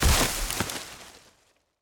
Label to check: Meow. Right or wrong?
Wrong